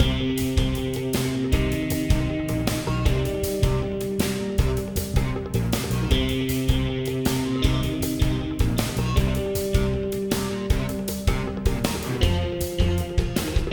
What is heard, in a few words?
City Platforming MIXED; ben 10